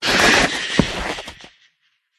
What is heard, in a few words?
bat death